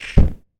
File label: Gas Large Flame Ignite